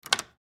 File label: Door Front Door, Door Front Door Lock; Household; Miscellaneous Doors